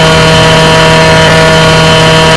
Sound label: engine idle reverse, tank